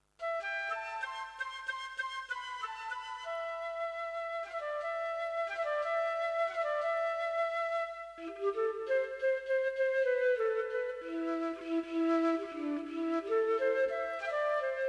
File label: Latin Flute impro